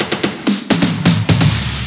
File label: Fills 128BPM, Clubsounds